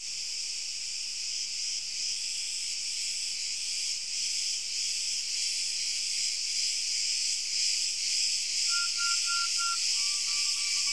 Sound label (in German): Grillen Frei Ober Ton Rau Spiel